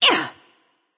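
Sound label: grunt attack, female; smite; voicepack; hera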